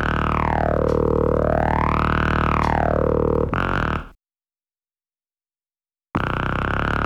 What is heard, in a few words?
distorto, Synths; Notes